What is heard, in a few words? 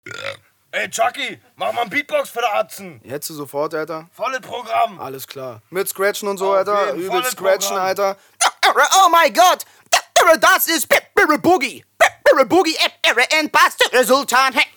beatbox